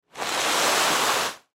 Hockey Goal Net, Hockey Goal Net Slide Move On Ice; Blastwave